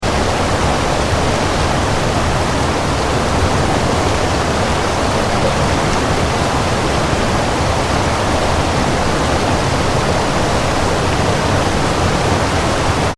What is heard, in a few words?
water river rapids deep fast; River / Rapids - Heavy , Fast Flowing; Rivers; Nature; River (Heavy, Fast) 3; McKinney Sound